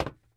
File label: metal box run; blocks; step